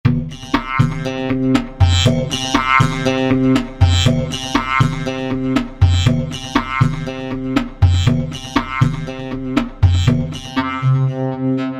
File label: Synapse 2, Roland V-Synth